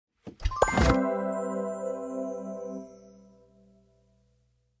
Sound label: Gift Box; Losing Streak; Popup